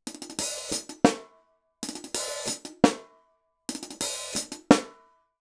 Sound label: Hihat Snare; Samson CL 2